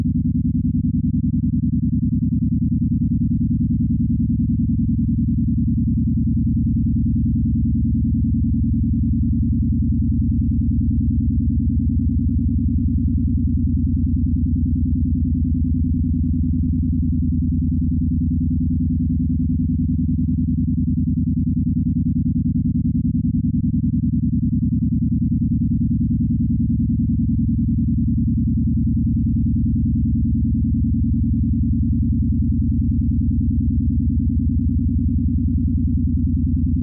binaural beats sine alpha 10.3Hz

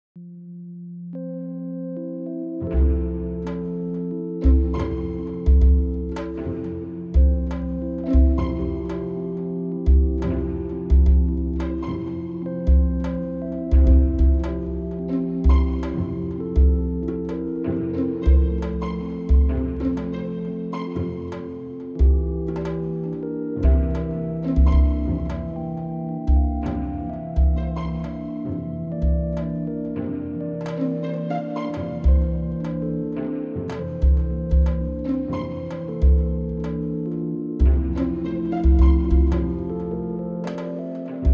multiple harmonix

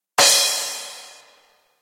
Cymbals, Kawai K1

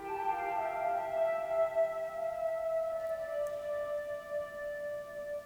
Flute; Cumbria Remixed Sample Pack

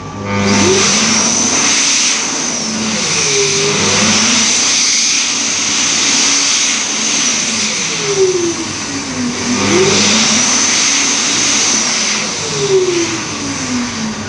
hand dryer solos, Wapping; the prospect of whitby

